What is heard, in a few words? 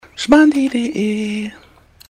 jingle